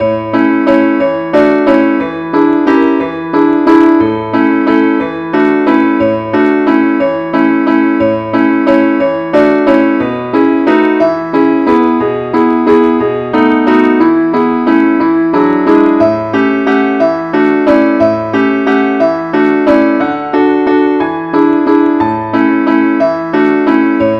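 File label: angel voices advance accompaniment key A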